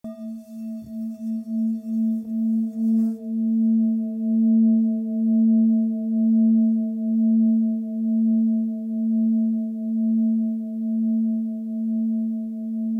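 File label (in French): Bol full moon la, bols chantants tibétains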